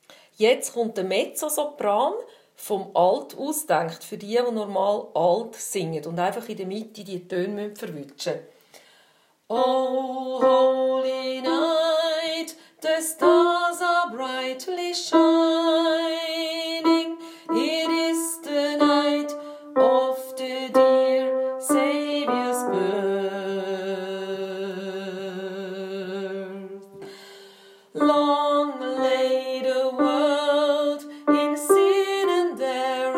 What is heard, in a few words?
O holy night Alt Mezzo